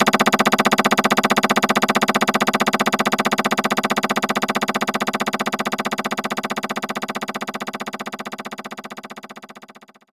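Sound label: Guitar Cut Up; knights try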